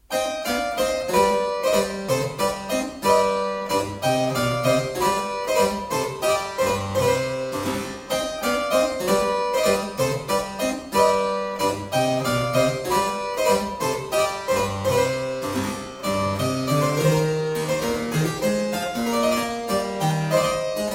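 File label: Suite in D minor Hornpipe, Harpsichord; John Blow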